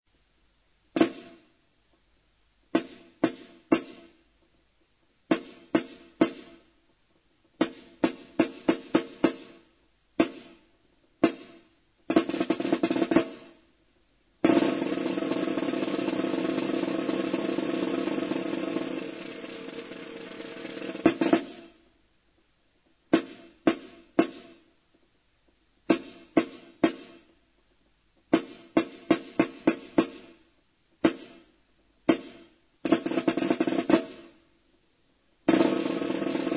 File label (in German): Zapfenstreichlocke